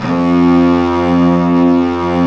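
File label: cello C; AKAI S-Series CD-ROM Sound Library VOL-2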